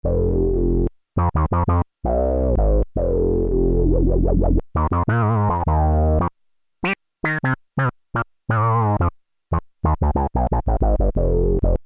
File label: Funk Bass, Korg Poly-61M